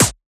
Percussion; FLPRO

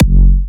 Hitter F#4, Big Room Kicks

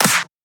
Shifted Clap; FLPRO

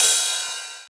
Ride; FLPRO

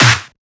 SnareClap; FLPRO

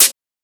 Closed Hat

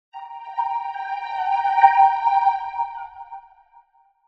Windows XP Startup Sound in Floating, Vocoder

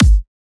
Kick; FLPRO

